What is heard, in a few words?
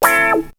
WAH WAH GT; BEAT2000